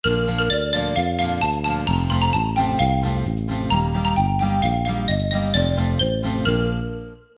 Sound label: End Game Theme; Konstruktor